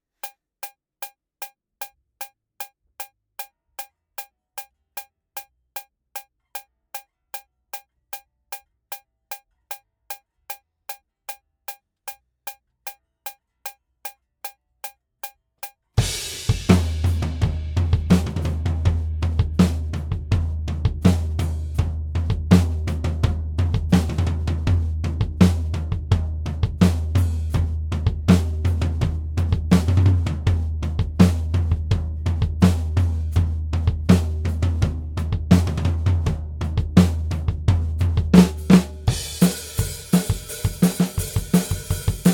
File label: Drums ONLY; Pre Pro